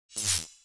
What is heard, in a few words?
zap off; Minetest; VoxeLibre